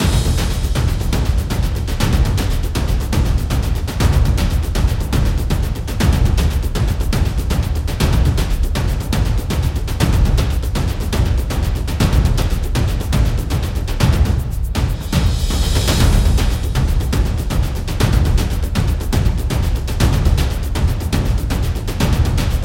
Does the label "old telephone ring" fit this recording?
No